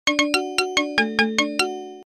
sms

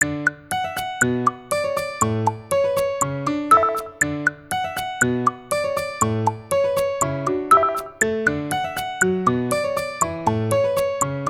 Trampoline; LG

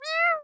Jazzy; Samsung; SGH-i300; keypad

